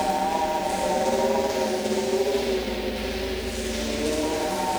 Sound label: Cracklature, sparse soundscape